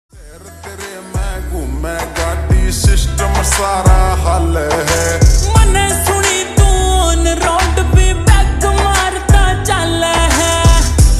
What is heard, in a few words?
Slowed Reverb Ringtone